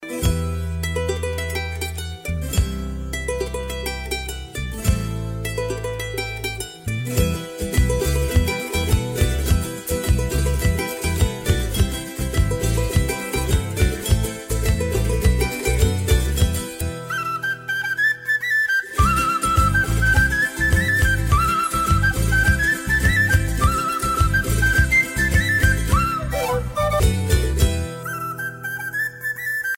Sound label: guitar in flute